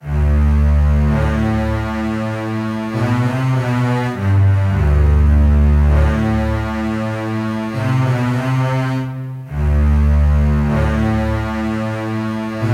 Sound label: Basses - Amin 100BPM; Construction Kits; STEMS; Ghosthack; SkyRealm; Kit 10 - Windhelm Forest